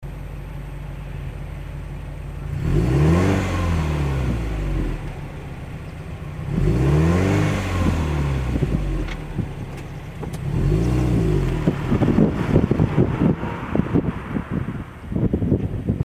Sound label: 3000rpm OUTSIDE; GDB